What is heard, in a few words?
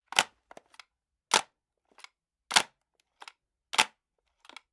Generic Button Plastic Medium; boom library; The Interface